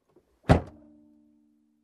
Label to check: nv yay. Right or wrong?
Wrong